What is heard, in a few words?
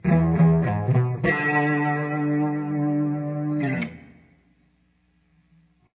artificial harmonic